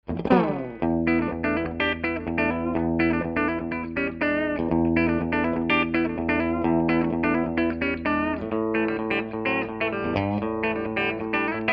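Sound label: 59 classic clean bridge, humbucker; schwarzbach; HB59-Classic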